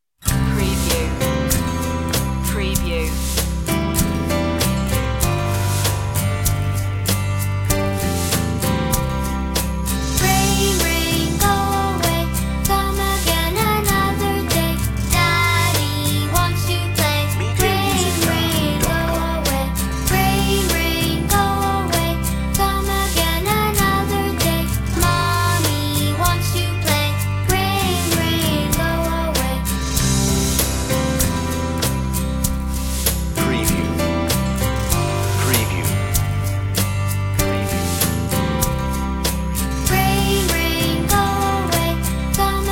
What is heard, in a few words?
child solo vocal no sound-fx